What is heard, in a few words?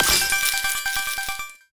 daily money box